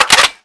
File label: mag out, weapons; cstrike; valorant; reaver vandal